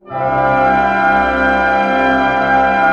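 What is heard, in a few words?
pentatonic C pianissimo, orchestra; Sound On Sound - Peter Siedleczek's Orchestra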